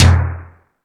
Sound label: More Toms, Drum Machines